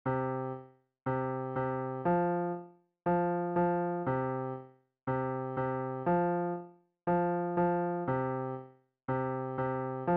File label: Chords